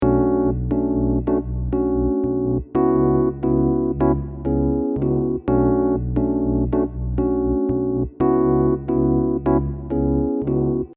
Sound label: rhodes